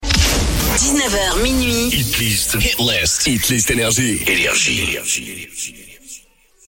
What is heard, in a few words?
Jingle; NRJ